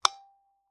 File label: Taxi Meter